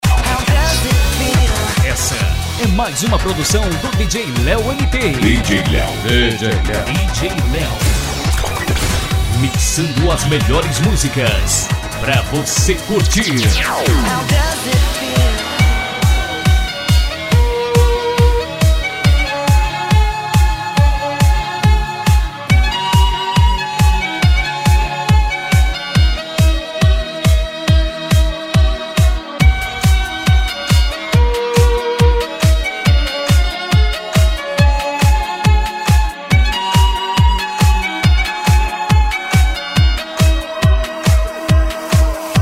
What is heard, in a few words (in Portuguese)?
sertanejo remix e electro dance